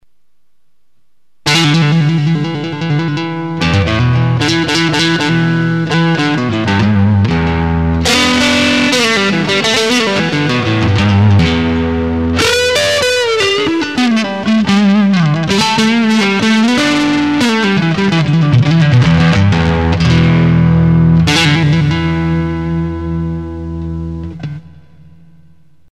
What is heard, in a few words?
Hybrid Neck Drive, guitar pickups